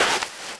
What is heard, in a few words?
Walk Dirt; Footsteps